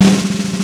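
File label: snare roll mono, Yamaha Motif ES drum machine; 300 Drum Machines; Yamaha Motif ES-Series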